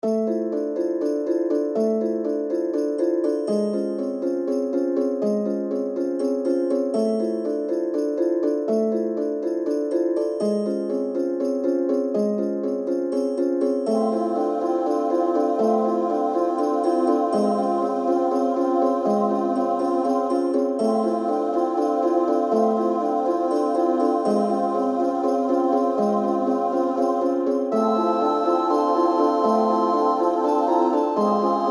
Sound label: Blizzard; Daggerfall arranged